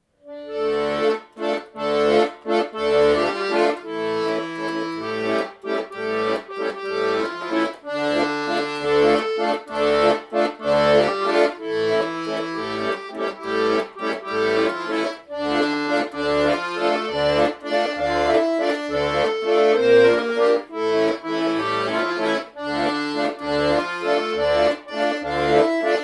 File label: Tombolaika tune